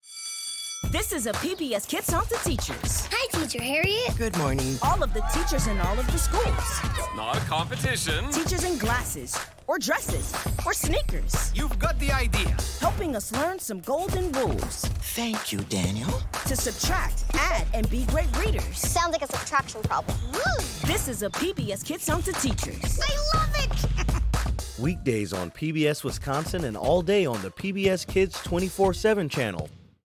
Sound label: Hip Hop; PBS KIDS